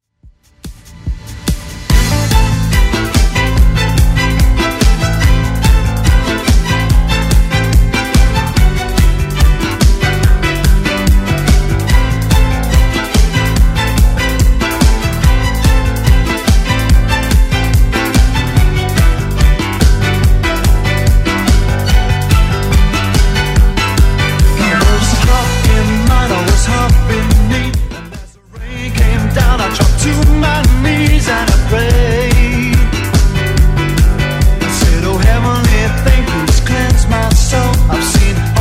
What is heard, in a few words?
80s Redrum, 144bpm